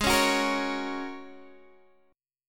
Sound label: G sharp 7th Suspended 2nd Suspended 4th, Guitar, Open D; down; chord shape